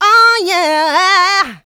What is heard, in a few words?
Vocals, Adlibs; Houseworx